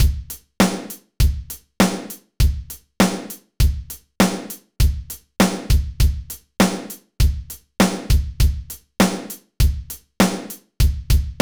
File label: drum normal